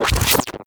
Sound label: Beam Impact Generic; Warframe